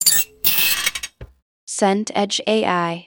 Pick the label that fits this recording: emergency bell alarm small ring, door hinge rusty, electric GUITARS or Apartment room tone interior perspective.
door hinge rusty